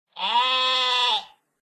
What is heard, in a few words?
Sheep Sound